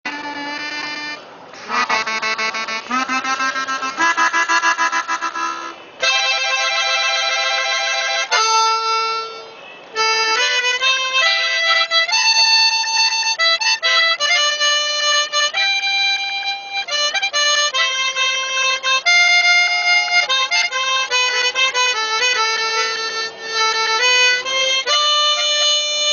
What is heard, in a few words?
Harmonica